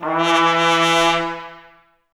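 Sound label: BRS trumpets E; 90 s Sample CDs; Miroslav Vitous; Woodwind Brass Ensemble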